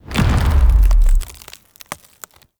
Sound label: Mockery Grab Start; Enemy; Mockeries; Abilities